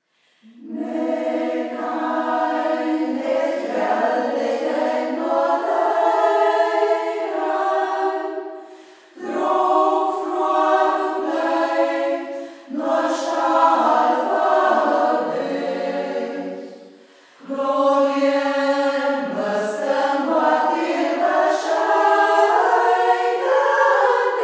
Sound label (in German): singkreis; Singkreis Kampestweinkel; Ortsmundart: Braller; Schwech ställ; hegt wird gesangen